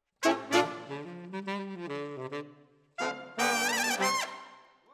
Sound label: Sax